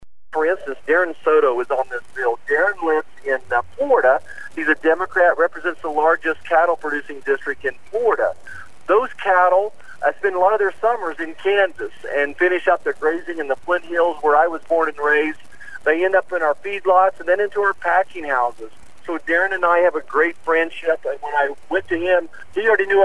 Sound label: Backing